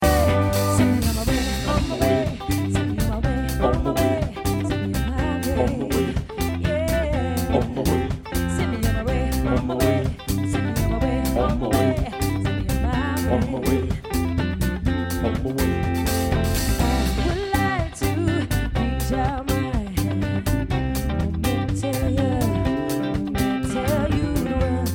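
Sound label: swing, gypsy swing twist on popular songs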